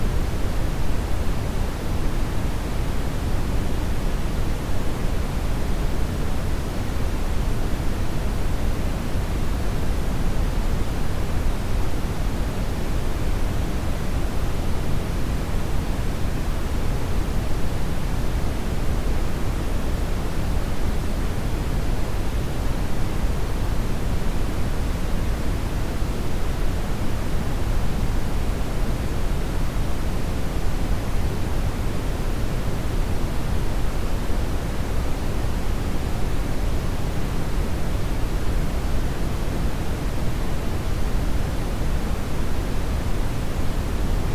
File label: Brownian Noise with 10 minute and 1hr warning